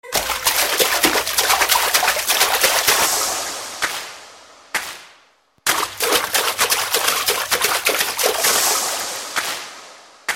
Water music

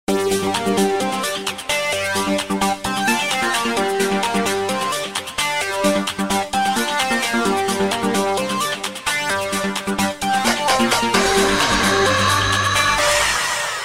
plasma, electronic ringtones

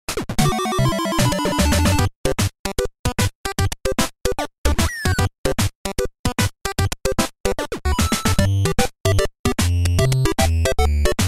NES Mini menu theme

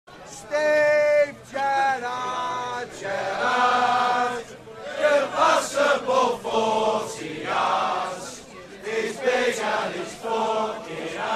chant; steve gerrard

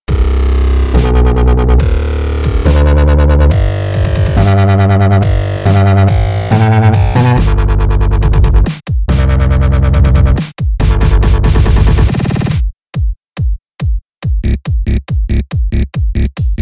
Wobble twooo

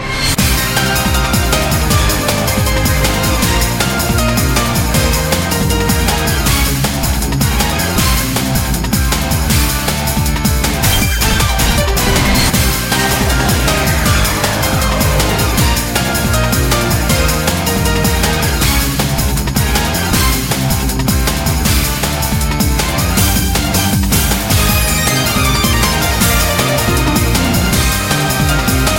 off vocal

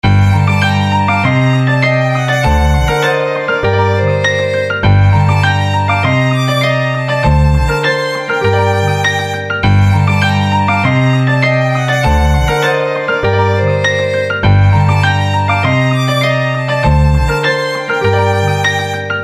Hip Hop Violin, ringtone

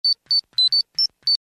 Communicator beep

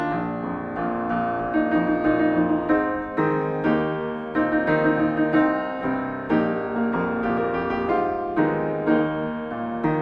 Piano

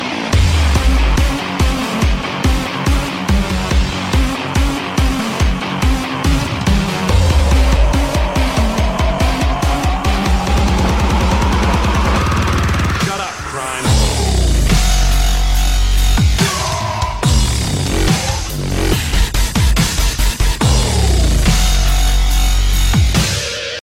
DUBSTEP

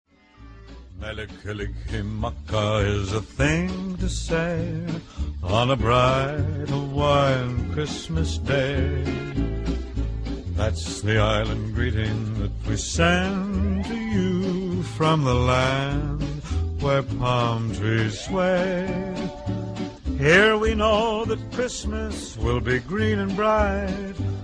sung

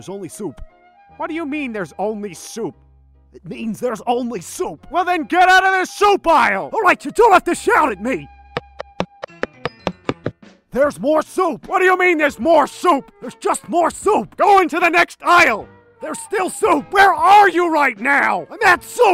LG, ringtones

